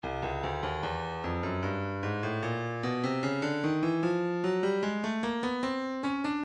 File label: breakbeat 075 bpm; Stylus Breakbeat Midi Files 075 079 Bpm; Grave C